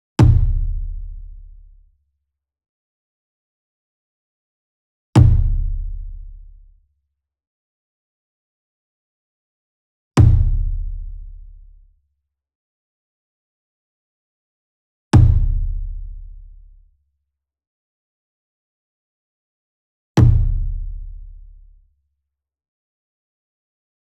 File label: ENSEMBLE DRUM Hit Near; boom library; Cinematic Strikes Construction Kit